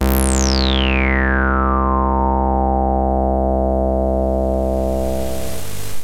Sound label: 303 FILTER, Transwaves; Soundengine - CSR-000 The Art of Transwaves